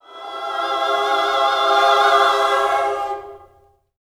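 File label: JOY C major, Vocal Gospel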